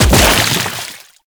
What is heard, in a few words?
Land Heavy Sludge, footsteps; Warframe; Tenno